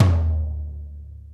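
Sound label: floor, drum kits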